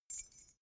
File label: key ring small fly tinkle